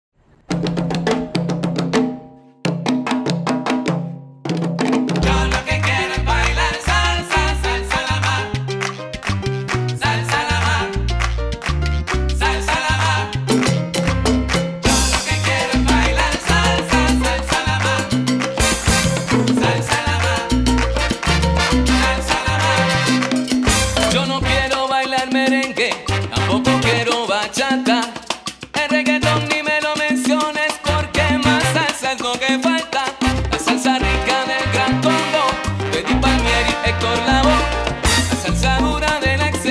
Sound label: Salsa, 204bpm